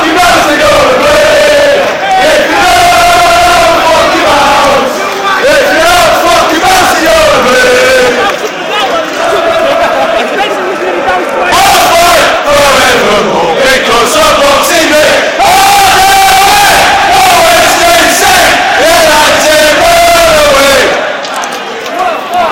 Wednesday fans in fine voice

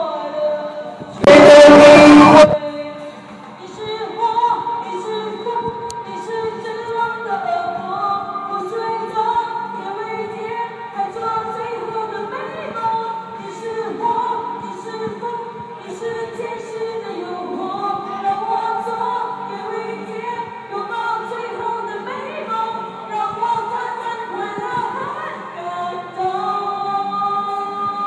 malaysian karakoke bar girls singing

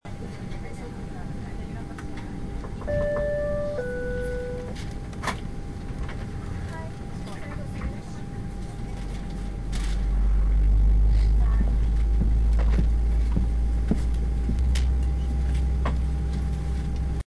Heathrow before take off

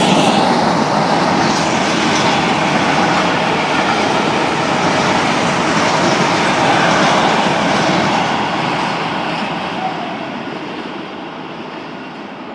freight train passing through Bingley station

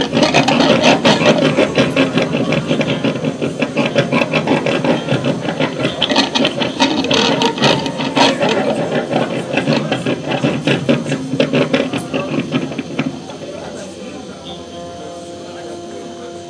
big band; ozok mokronog; huy

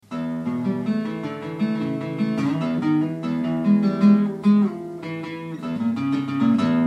closure riff

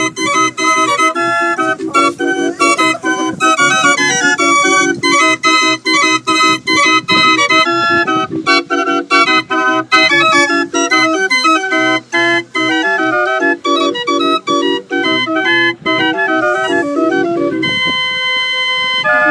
organ grinder; snibston

